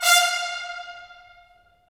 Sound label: BRS, Tps Stacc; Roland - LA Composer vol. 4